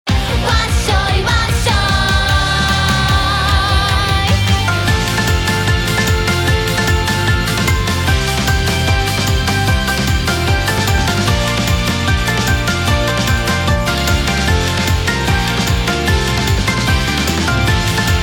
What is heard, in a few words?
Jingle Title; Taiko no Tatsujin Drum Session; Session de Dodon ga Don; PS4